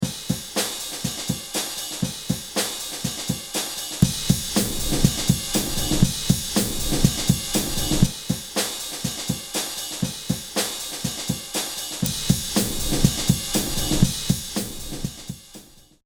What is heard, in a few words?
drums; infiltrator